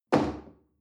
chair wood place medium

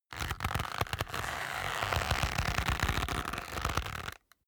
leather creak long soft

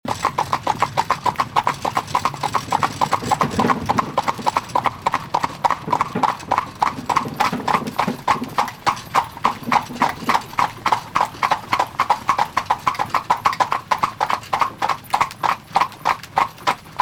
horse carriage going fast

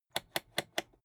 rotary control short turn

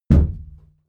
shield sheet impact dent low

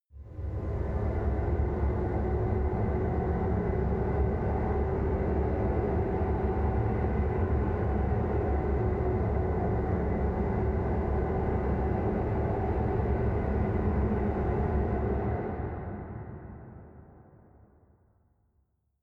machine room dark

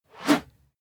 whoosh whistle hard

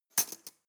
cutlery teaspoon drawer handle

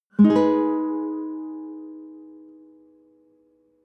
nylon guitar chord high minor

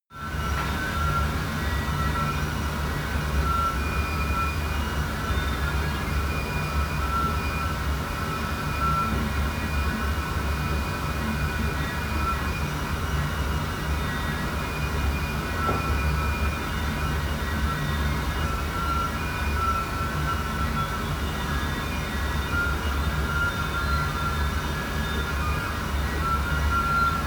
bathroom

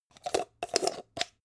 jar plastic midsize close

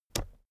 keyboard external space push heavy

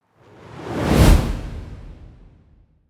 whoosh

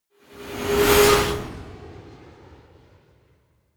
flyby

